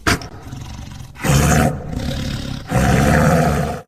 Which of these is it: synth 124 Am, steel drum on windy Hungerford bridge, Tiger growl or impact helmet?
Tiger growl